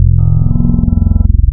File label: WIND, wavetables Classic Synths